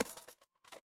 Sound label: impact crash